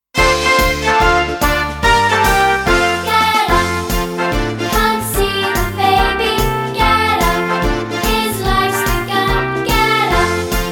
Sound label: with vocals